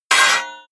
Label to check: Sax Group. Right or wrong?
Wrong